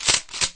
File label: Shotgun; WoWInterface; WeakAuras